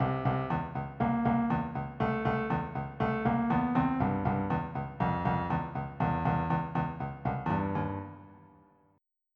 new wave

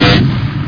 zap

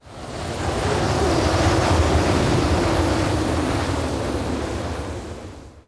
wind; DAoC

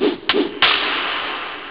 whip

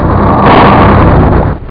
Crash!; How Many Bugs in a Box

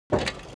target turn; Rapid Gun; ipsc

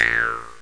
Jew harp; Oasis demo

